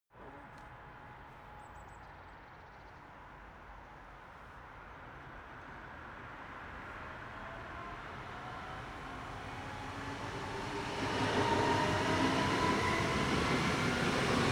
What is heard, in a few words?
train city train accelerate depart long